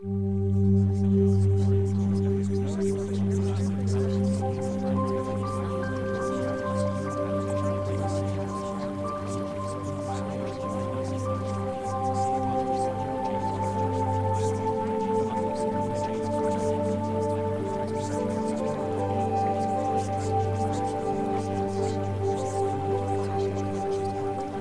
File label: Music